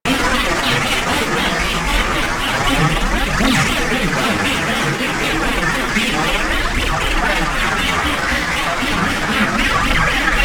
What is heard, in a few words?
Space Pod Forward; Grineer Space Pod; Vehicles; Warframe